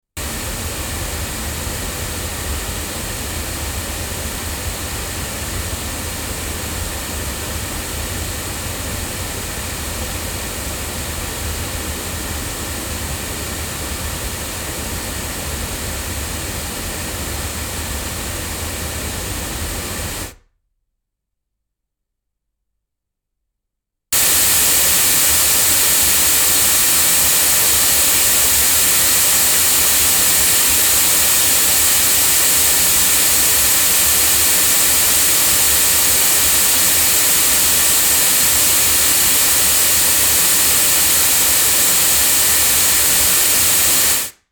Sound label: loudspeakers; sE Gemini; microphones; se electronics